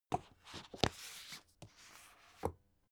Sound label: book shelve soft long; haus garten; wohnen